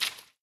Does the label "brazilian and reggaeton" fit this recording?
No